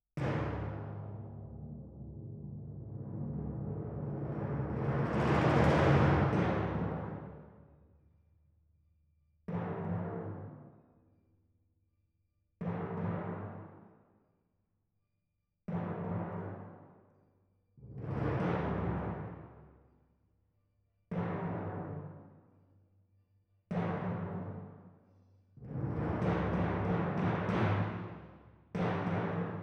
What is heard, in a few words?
Timp